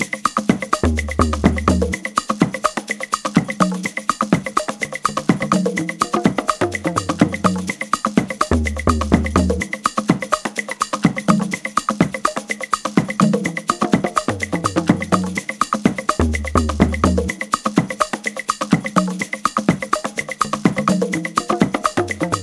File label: House Perc Loop; Kount's Home Stretch Sample Pack